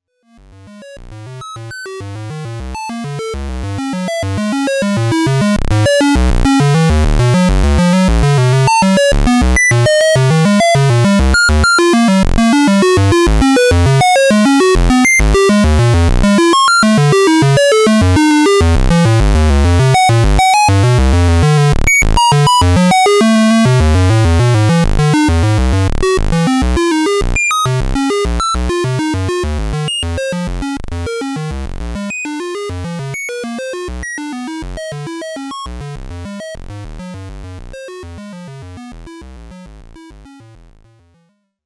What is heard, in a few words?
Square Wave; analogsynth